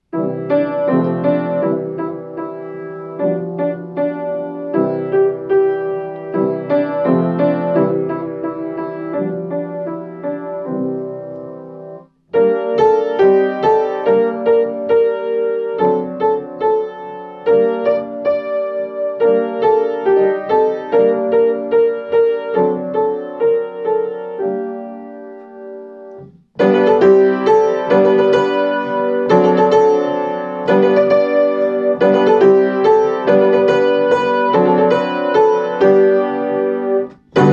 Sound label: Mary Had a Little Lamb, piano solo